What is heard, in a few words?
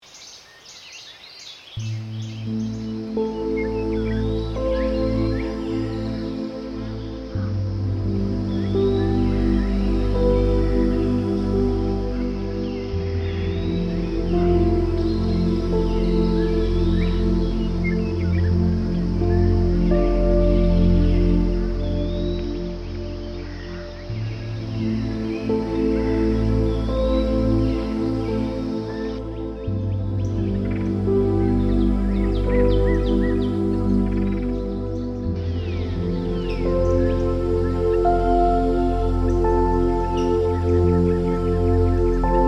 Music for Ketamin Sessions Theme 2 with Birds